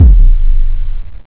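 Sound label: Ending kick open hi-hat; jazz jackrabbit 2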